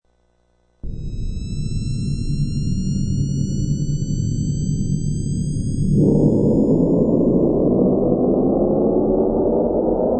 Jet Engine, Sound effect of jet engine building power and then taking off.; Airplanes; Vehicles